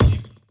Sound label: down blaster; gonner linux macos ps4 switch windows xbox one gamerip 2016